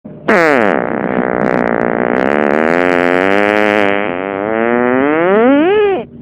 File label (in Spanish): Pedo carrilet